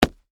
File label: Footsteps, Combat, Boots, Stomp, Single, Concrete